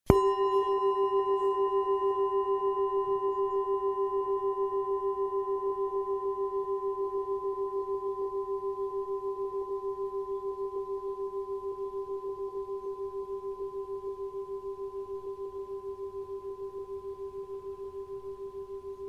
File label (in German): PLANET BOWL Siderischer Tag